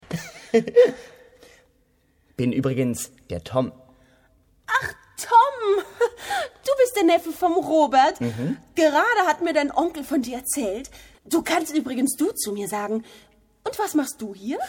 musical; drachenburg

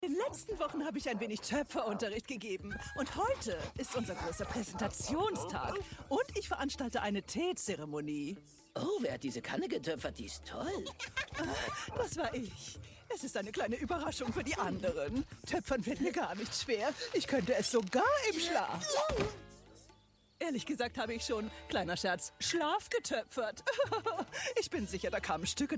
frau urschrei; Muttersprache Deutsch, Französisch

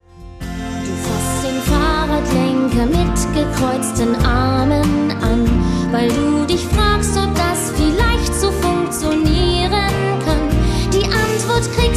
gesangsbeispiel

